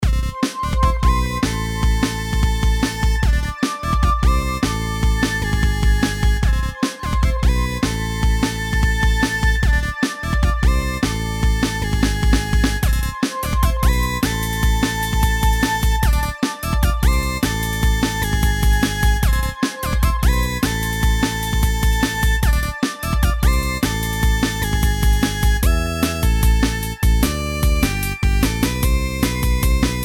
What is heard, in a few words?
Lullaby Pop Electronica